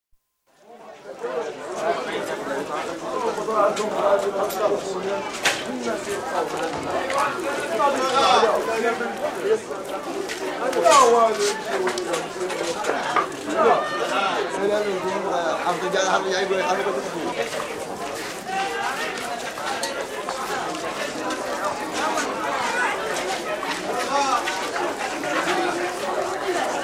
Morocco: Meknes, food market (medina) with some distant traffic; Stores And Mall Sound Effects; BBC